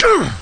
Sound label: grunt; kingpin